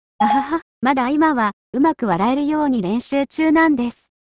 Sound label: hahaha